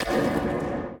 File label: Impact; Phasmophobia